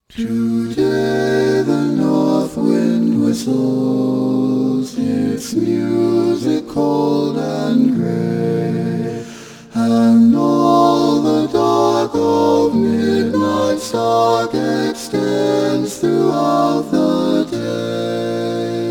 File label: Hymn